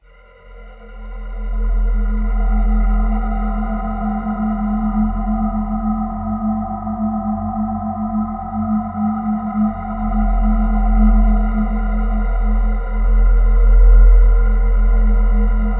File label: Cosmic Forest Atmosphere F 142 BPM